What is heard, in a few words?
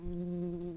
Humilis workers, bumblebees